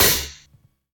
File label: nice hihat sound a sharp key, Loudest frequency: 8479Hz